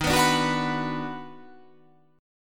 D sharp Minor Major 7th Sharp 5th; x,18,21,19,18,18,20,20; chordshape

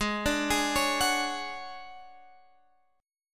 D Major 7th Flat 5th, chord

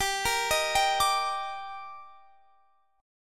D sharp Major 7th

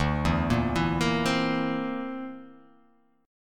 D 7th Suspended 2nd Sharp 5th D

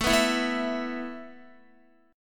A Suspended 2nd A Guitar; strummed; JGuitar

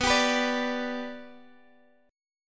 B Minor Sharp 5th B Guitar Half Step Down, chord

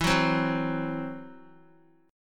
E Suspended 2nd Sharp 5th, Guitar, Esus2#5 chord; strummed; x,7,6,5,6,x

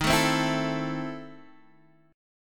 E Major 7th Suspended 4th Sharp 5th, D sharp

